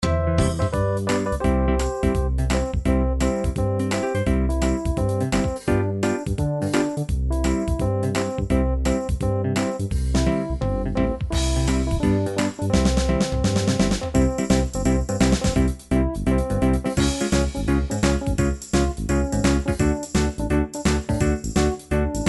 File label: traditional gospel